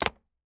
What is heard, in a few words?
favorite unclick